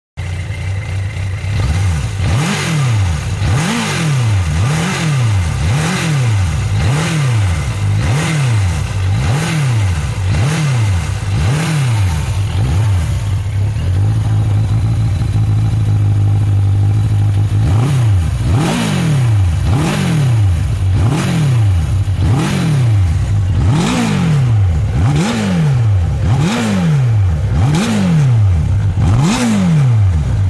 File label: Motorcycle Road Racing